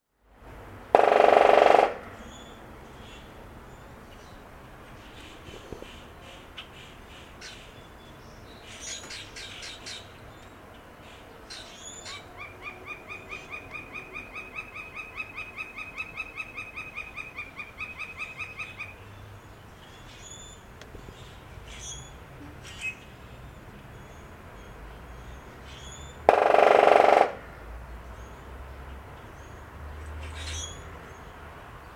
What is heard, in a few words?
Flicker on gutter my house